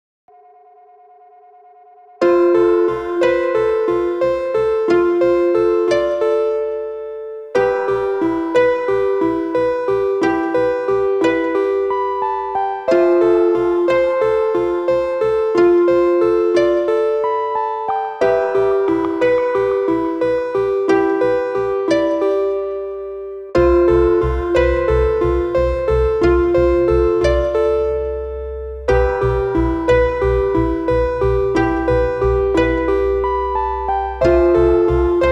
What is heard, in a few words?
ballad